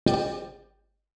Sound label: ambient